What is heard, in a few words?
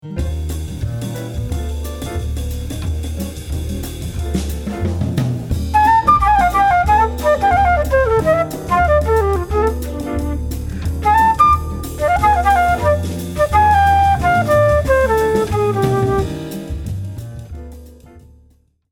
d minor penta